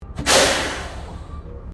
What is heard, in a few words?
Bus Double Decker Bristol VR 1971 Air Brake; Bellarine Tourist railway vehicles; Busses (Sound Effects:Vehicles)